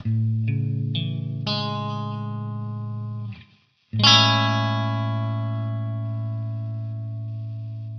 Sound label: a major7